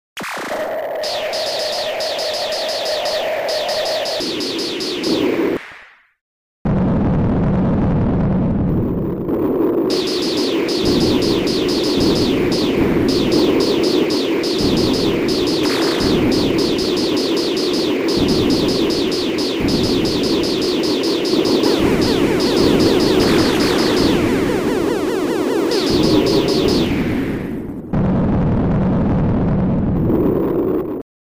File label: ship